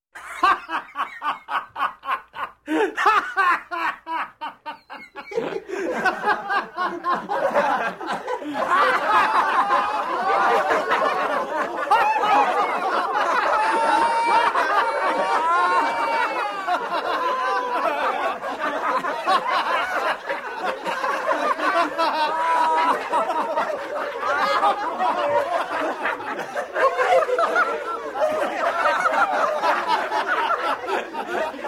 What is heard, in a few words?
Group of people laughing